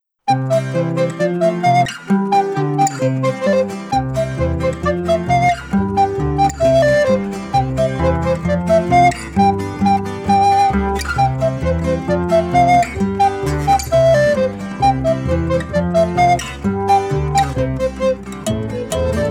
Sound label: instrumental